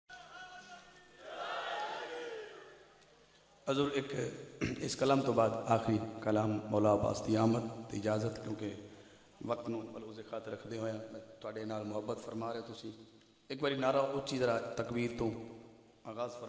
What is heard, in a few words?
Urdu naat